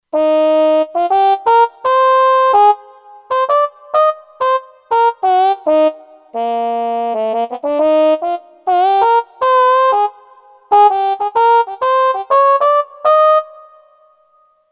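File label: Straight Mute Trumpet, Kurzweil K2500X; Factory Programs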